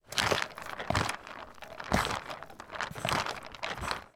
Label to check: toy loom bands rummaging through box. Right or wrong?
Right